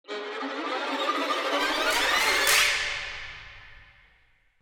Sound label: Video game reward chest opening horror